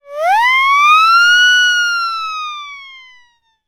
cartoon siren whistle long

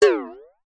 cartoon metal impact

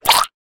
cartoon squish squelch wet; zapsplat

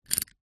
household padlock combination dial turn; zapsplat

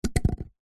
cardboard tube toilet roll drop carpet; zapsplat

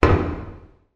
impact metal hit reverb; zapsplat

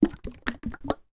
food soup can shake

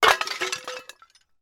impact tiles metal debris; sound effects one

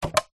food drink cola can full open pick up; zapsplat

